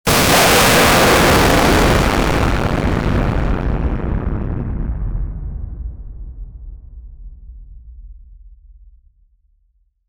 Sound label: the boom of a sonic boom as a jet breaks the sound barrier